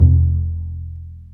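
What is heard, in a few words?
String, contrabass pizzicato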